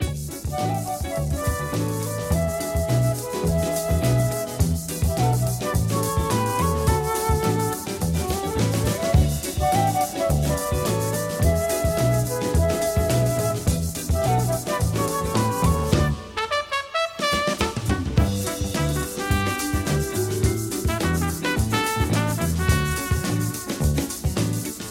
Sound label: Loop; Spore